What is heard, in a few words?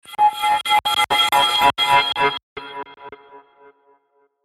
Stutter Ring Delay Swell